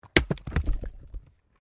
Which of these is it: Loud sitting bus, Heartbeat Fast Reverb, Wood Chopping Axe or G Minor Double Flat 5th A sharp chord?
Wood Chopping Axe